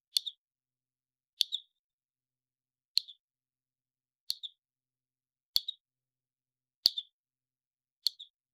cricket ball fine outside

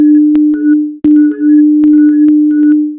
uplifting 500 450 hz chim; aquatic

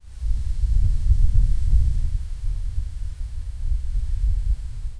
slow wind sweeping across, open field

